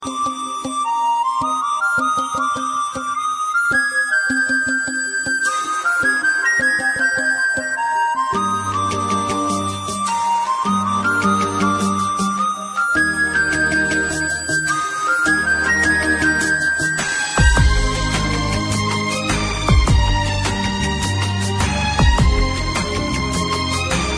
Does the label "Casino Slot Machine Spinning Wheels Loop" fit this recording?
No